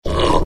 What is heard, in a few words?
pit drone hunt; svencoop; legends